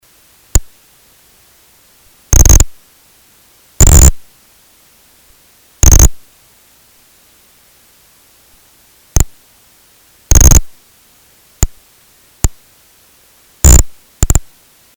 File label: vaquita sounds